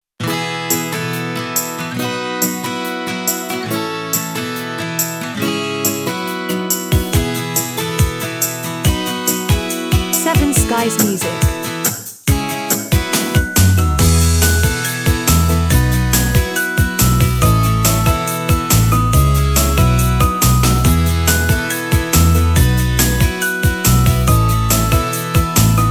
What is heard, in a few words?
Cheerful Promo